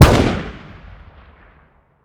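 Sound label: weapon ar fire player atmo exterior; gmod; viper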